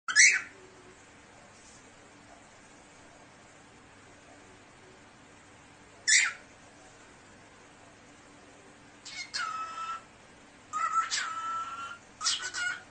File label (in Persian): صدای عروس هلندی حرف زدن عروس هلندی